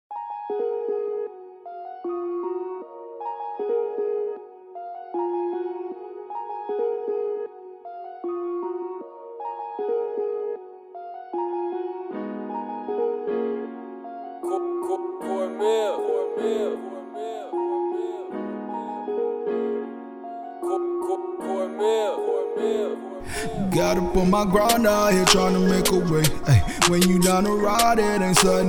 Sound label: Instrumental